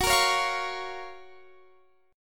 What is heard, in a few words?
F sharp Major 7th; F#M7 Chord; strummed; x,21,20,18,18,21